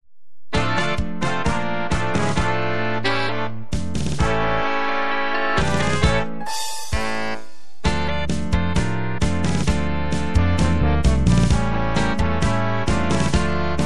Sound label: Tango